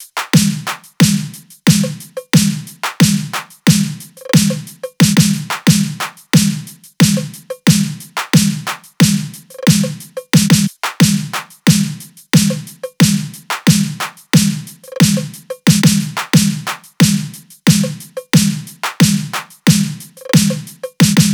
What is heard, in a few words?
top 90, Drum Loops; SD MIDNIGHT Sample Pack; Slate